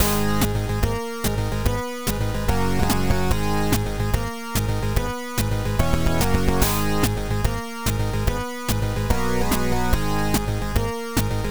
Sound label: Boss Battle